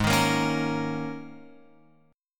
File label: Ab Suspended 2nd Suspended 4th Ab down Guitar Open F; strummed; JGuitar